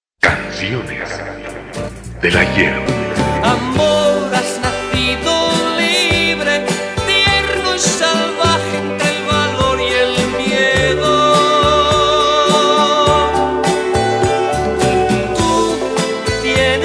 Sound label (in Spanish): ring tone